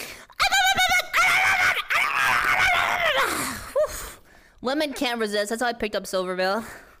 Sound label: bark rabid